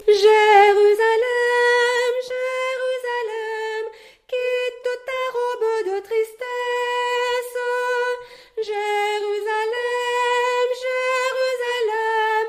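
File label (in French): Mariage Debout S, chœur; Soprano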